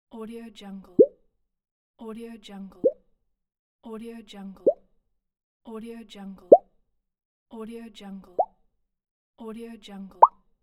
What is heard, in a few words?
popup; beishome